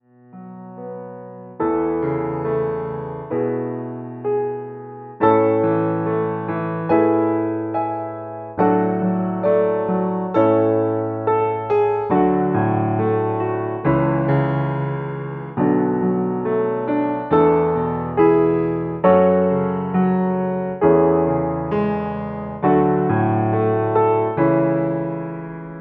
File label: Piano, Karaoke